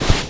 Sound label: dry sand loose, footsteps; creatures